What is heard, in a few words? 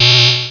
Buz Echo